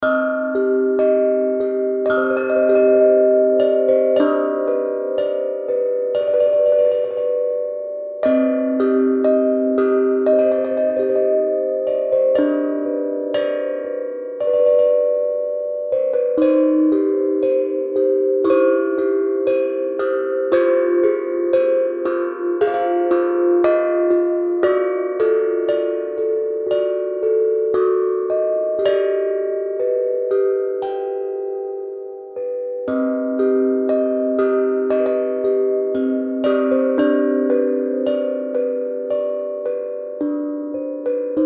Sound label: Tongue Drums Gently